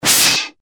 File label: Air Blast